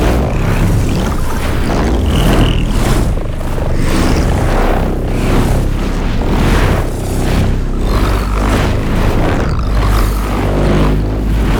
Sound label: brimstone charging loop; Roathe; Warframe; Tau; Abilities